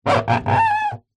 foley glass window rub squeak finger

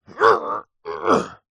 human male voice grunt groan lift heavy weight

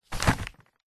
wrapping paper roll set down; zapsplat; foley

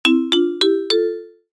cartoon ascend short; zapsplat

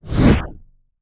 Swell Flanged; bottlerocket

